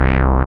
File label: SYN 202, Roland MC-202; Sound & Vision - Gigapack I CD 2